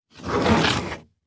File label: Foley Footstep Hard Sole Shoe Scrape On Solid Wood Platform; Foley Footsteps